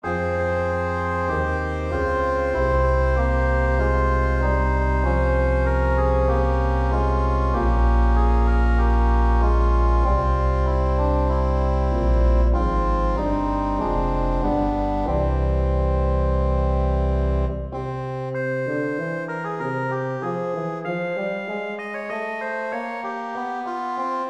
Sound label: Preludium f